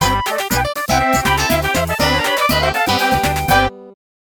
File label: Bonus Round Completed; Elmo's Number Journey; n64